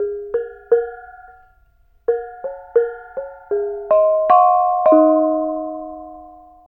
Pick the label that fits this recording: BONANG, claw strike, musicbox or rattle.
BONANG